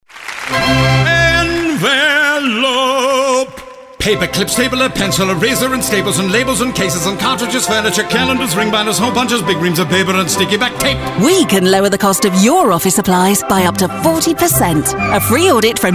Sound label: Singing Ad; Wgo